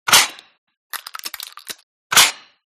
mp220 reload, guns

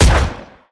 saiga, guns

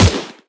mac10, guns

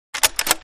awc pull, guns

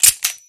m93r switch, guns

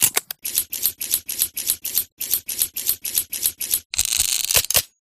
colt45 reload, guns

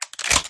spas12 pull, guns

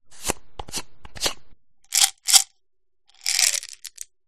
pills use

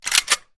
famas switch, guns

